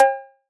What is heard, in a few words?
nu disco cowbell